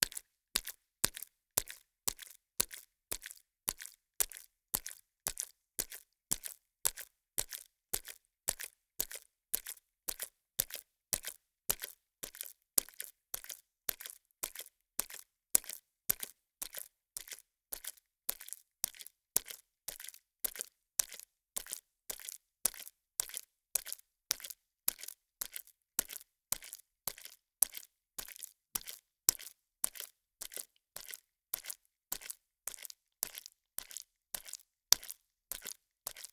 パンパン; 効果音

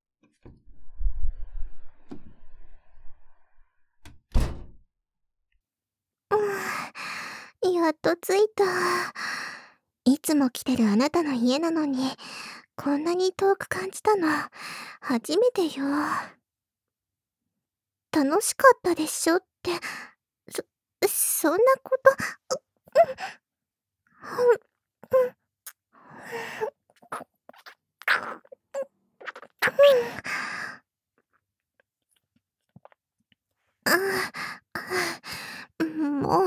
帰宅からのシャワーシーン

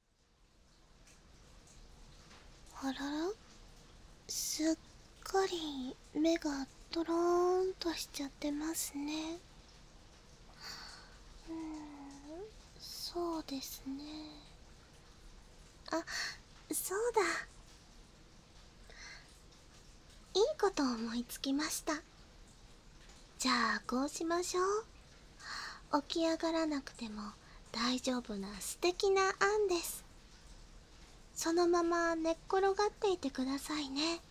添い寝 雨音有り

